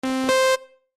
Chime Musical, Chime Musical Multimedia; Electronic; Multimedia Web Interactive; Chimes; Blastwave

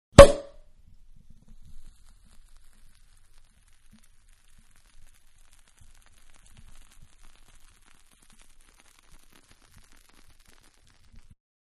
Champagne POP Cork Fizz; Corks; Bars; Manhattan Production Music

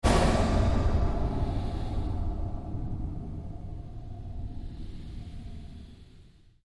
Created Pulse Fade, Rise And Dive Sound Effects

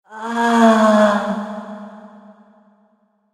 A Ghastly Moan; Ghost Sound Effects; horror

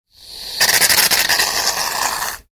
household shaving foam spray; Aerosol Shaving Foam Spraying / Squirting From Can; Bathroom

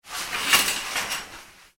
household shower curtain pulled; Shower Curtain Being Pulled / Drawn; Bathroom (Sound Effects:Household); McKinney Sound

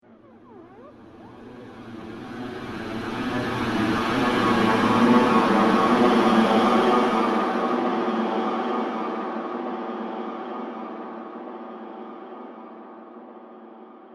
Drone The Outer Realms, drone sound effects; Science fiction sound effect

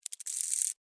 Insect, Wings, Movement, Shake, Rattle, Bug; Blastwave; Animals:Insects

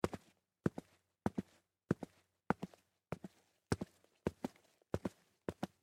Footsteps Tennis Shoes Walk; Human

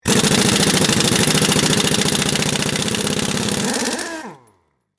Pneumatic Jack, Scifi, Hose, Disconnect, Expel; Science, Fiction; Spacecraft

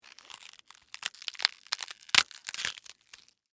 Crunch Tear Slow, Cabbage, Head, Vegetable; Texture

